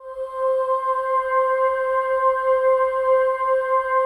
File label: digi pad, Pads